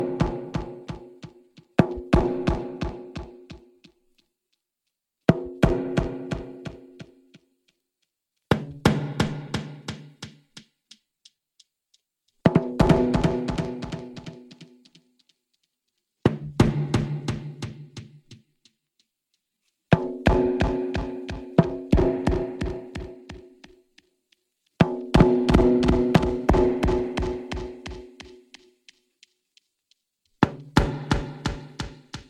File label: Timbales